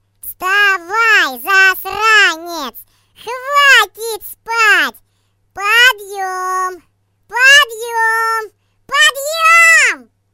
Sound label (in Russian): dlya budilnika vstavay